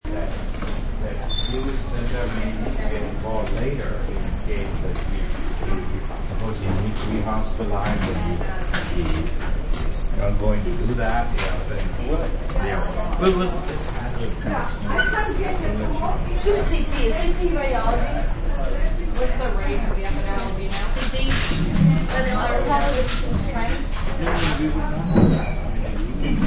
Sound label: Hospital, Backround sounds of a hospital including nurse chatter and equipment noises; Hospital Ambience; Hospitals